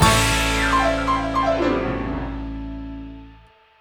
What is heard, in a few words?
Wild Water Big Win End